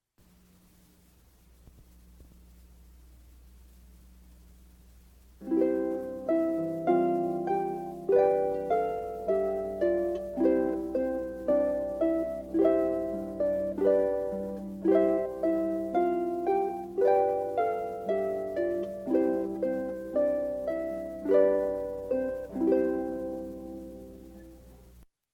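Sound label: chamber music string quartet; Ode to Joy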